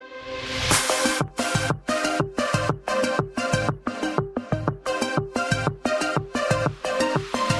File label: Ringtone